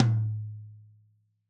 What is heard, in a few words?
Tom High-Mid; One Shots; Drums